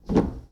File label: Foosball Forward Rotate